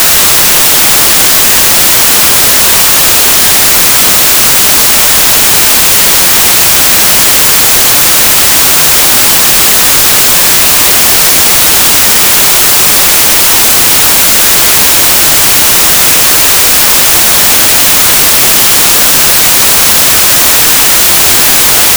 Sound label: white rect noise mono